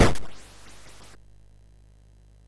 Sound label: sid MOS6581R2; California Games